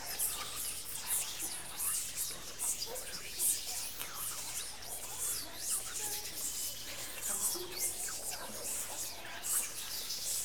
WHISPER; WHISPERS

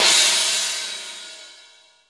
Cym Left & Right, Drum Machines Alesis D4

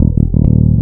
135 LOOP BSS, bass loop; Big Beat Samples & More

